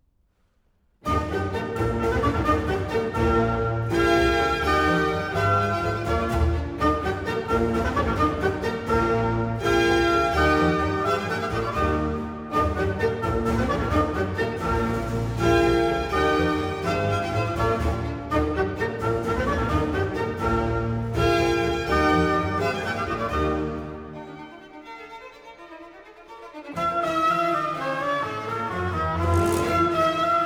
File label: Symphony No. 1 in E-Flat Major Presto; Birth Of The Symphony Handel to Haydn